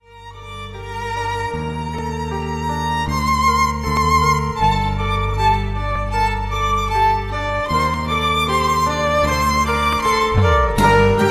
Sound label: Violinist